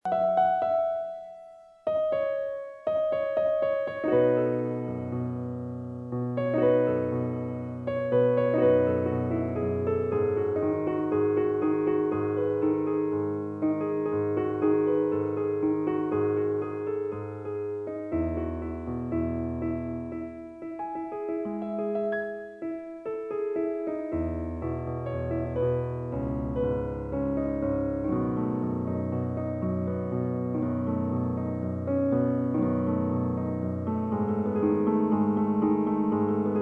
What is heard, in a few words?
Piano